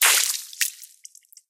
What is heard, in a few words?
Blood Gush